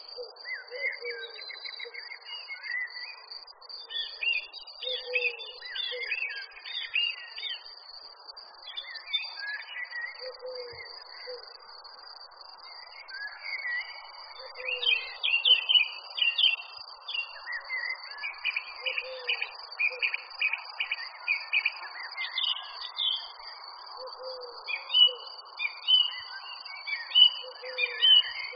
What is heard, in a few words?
Bird sounds summer garden